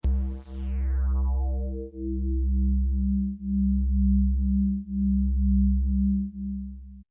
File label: Ambient Sine Pluck; nordlead2